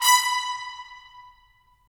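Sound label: brass trumpets staccato; Roland - LA Composer vol. 4; 90 s Sample CDs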